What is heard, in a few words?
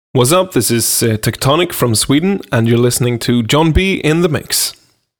shout